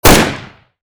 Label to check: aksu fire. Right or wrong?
Right